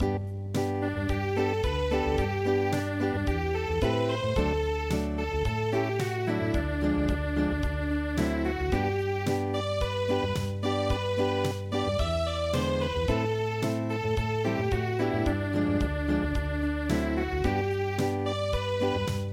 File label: Unplugged